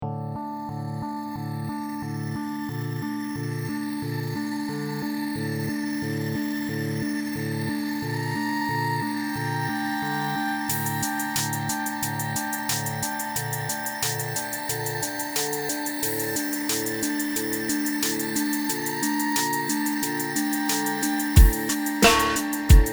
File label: Drammer